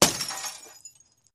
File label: Glass Smash Auto Hea